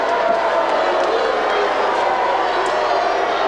Hockey Crowd Boo Loop; Crowds

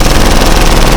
Assault Rifle Shot Loop; Weapons

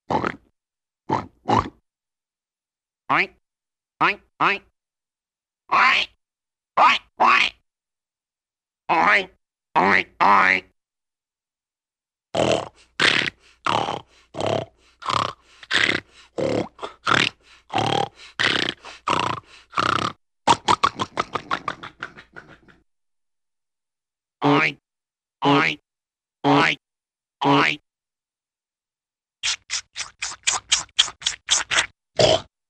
Funny Pig Sounds; Cartoon; Hollywood Edge; Cartoon Trax

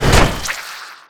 attack; Apotheorasis

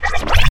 Gun Draw Select Scifi, Weapons Foley; SFX Kit

